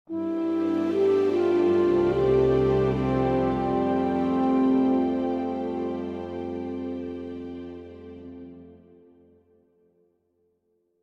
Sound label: Location Jingle New Lore Station; theHunter: Call of the Wild